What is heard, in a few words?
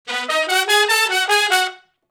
Shuffle Blues Riff (B) unison, Horncraft for R&B Sections